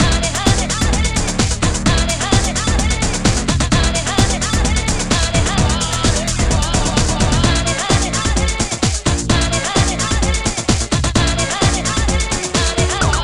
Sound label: amiga techno